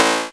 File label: error, Retro Sounds; Kenney's Sound Pack; Retro Sounds 2